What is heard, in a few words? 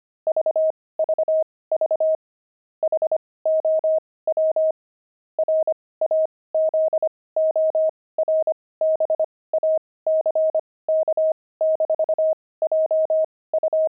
pangram frogs; Intermediate Pangrams 25cpm